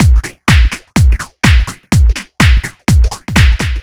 No Hats, Drum Loops 125bpm, Nu Dance Electro; Zero-G; Soundclash Nu Dance Electro; Acidized Wavs; Tough Jam

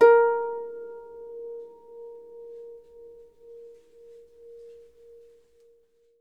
Harp A#4; Miroslav Vitous - Percussion & Harp

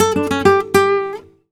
gta g, Flamenco, Guitar, Picado

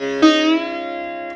SITAR LINE, SITAR MELODY

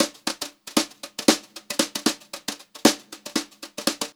Brushed Drum Loops, JAZZY; USB Soundscan vol.39; AKAI; 90 s Sample CDs

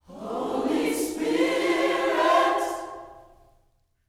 H SPIRIT, Gospel

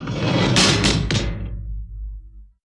PLATE, METL IMPA; Total Control - Action Cutz

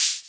Shaker; Equipped Music; Loungin House

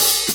New York Loop, Drum Tools, Laidback

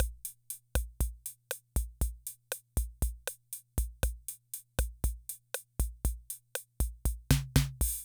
Loop, rhythm 55, classic machines

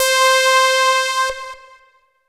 DANCE NORM; Best Service Dream Experience

